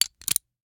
Rachet, vanadium steel, 8in, standard, locking, clicking, mechanical, new, socket lock, rotate; Eiravaein Works; Vaeyan IV